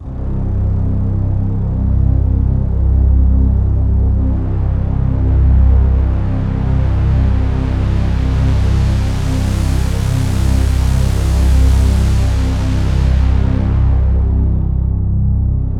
C1, Synth Line; 90 s Sample CDs; NiceBeats; SynthLine VOL-15

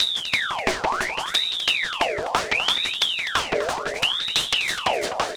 Drum Fx Loop, Electronica

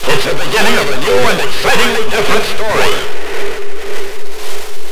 VOX FX; Big Beat Samples & More

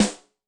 RIM natrl, Snare; Yamaha DTXpress-II

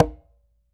L CONGA SL; Cuba; AKAI S6000 CD-ROM - Volume 5; CONGA 2

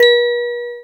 MUSIC BOX; Greytsounds; Scott Peers Sound Engineering

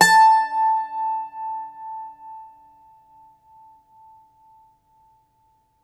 Irish Bazouki; E-MU Producer Series Vol. 7 – Old World Instruments

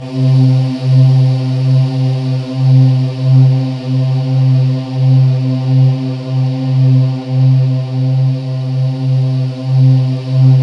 C2, Synth Leads

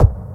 NEW BASE, DRUMS PERC; Best Service Dream Experience

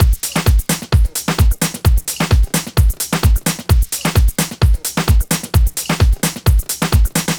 Quantize Swing, Drum Loops, 130 BPM; Underground UK House & Electro

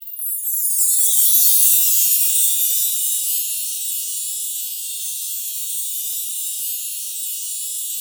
CHIMES; Best Service ProSamples vol.42

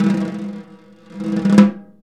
SNR SNARE, Orch Snares; Roland LCDP03 Orchestral Perc; 90 s Sample CDs

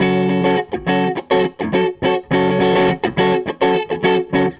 Gretsch Guitar Loop

